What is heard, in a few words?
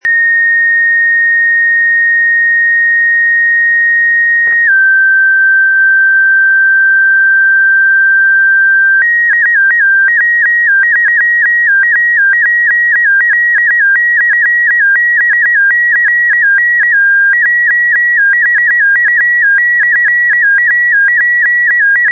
1296MHz FSK mono; W3APL B